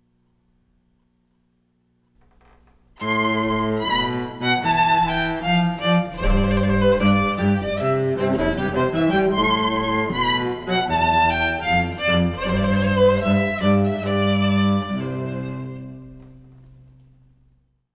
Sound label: Wedding March Duo; Mendelssohn